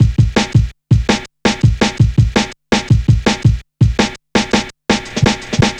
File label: Check A, Jungle, Drum Loops 165.5; Zero-G Jungle Warfare 1, 2 & 3